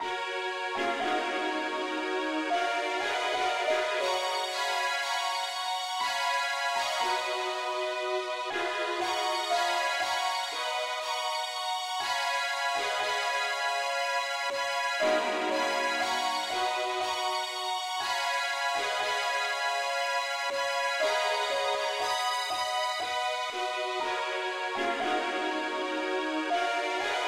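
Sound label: popuke lead key f sharp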